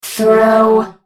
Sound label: ultimate effort; Oriana